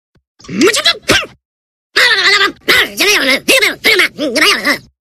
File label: Grumble, TV ADS Tone; Vodafone; Zoozoo